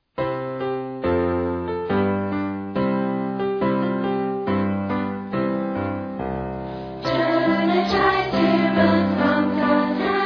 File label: music; whale